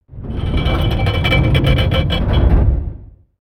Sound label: RV gate, ambience avant gardens